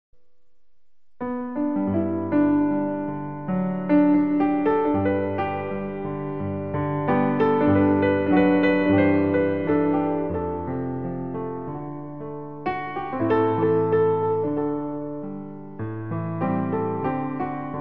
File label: Showtime Piano Hits